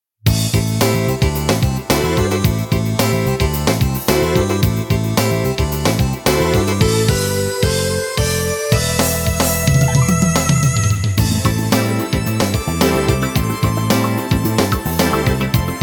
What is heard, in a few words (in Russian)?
pesnya dikih gitar minus